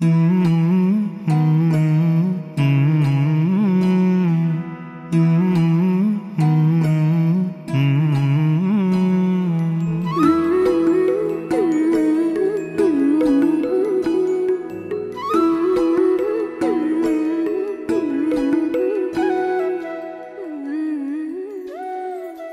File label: Hum Hum Ringtone